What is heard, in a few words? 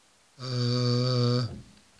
Vowel Sounds B